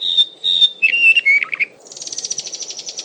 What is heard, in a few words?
Mohua yellowhead